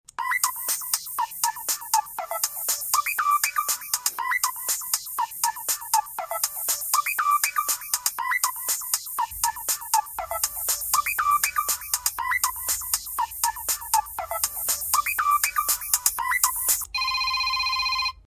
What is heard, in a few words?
atrix 4g, zvuki telephona motorola